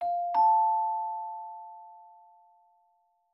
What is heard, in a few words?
Soothing Bell; Samsung; Galaxy A56; Calm